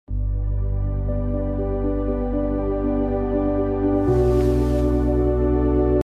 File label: bg music